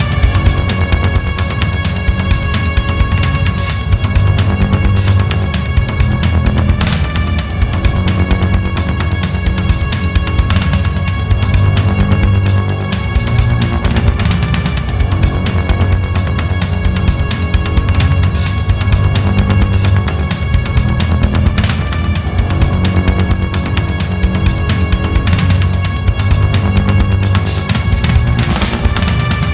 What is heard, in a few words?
Dramatic Drums Chase; Toby: The Secret Mine